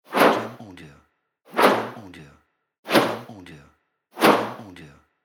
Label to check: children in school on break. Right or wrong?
Wrong